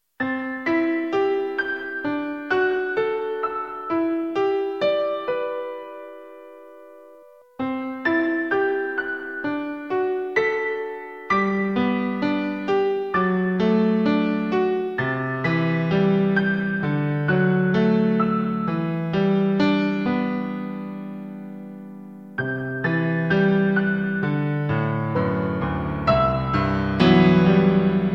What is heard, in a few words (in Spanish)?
piano triste